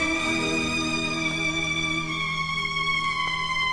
strings shrill, Sad; Loops

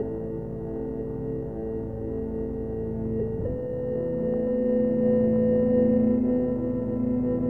keys, sad loops

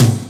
Toms, Real

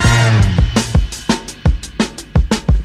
horn stab too quick, funky drum loops

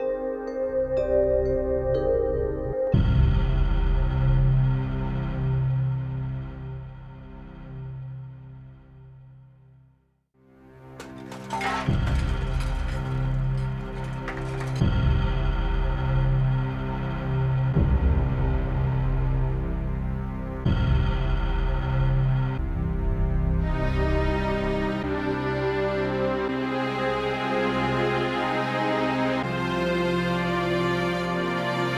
keys, Loops Sad

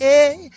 "yeah" SUNG

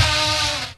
deflation

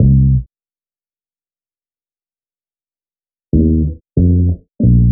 bass

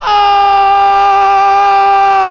uhhhh, Voice; Notes

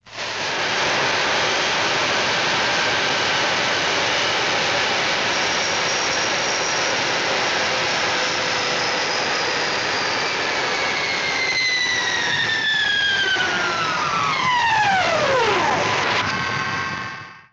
airplane, Vehicles

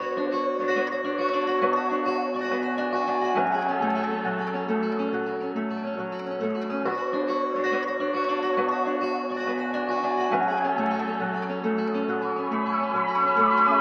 guitar, Sad

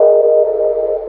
alarming; Loops; Electronic

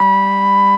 zip, zaps and beeps

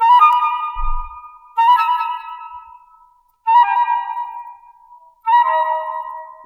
flute, Jazzmatazz loops

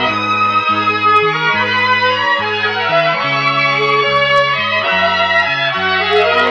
strings, Loops, Sad

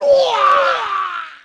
scream

